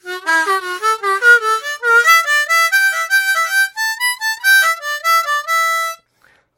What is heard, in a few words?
Harmonica Scale